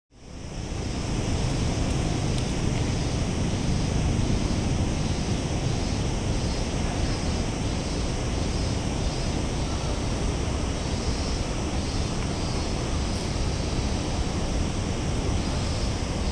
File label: ZOOM H3VR; 「ASMR録音」